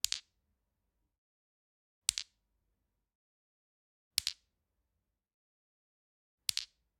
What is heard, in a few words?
Generic Click Glass Tiny; boom library; The Interface